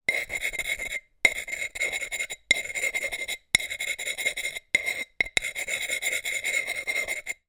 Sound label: foley sharp object scrape on surface on pottery as if engraving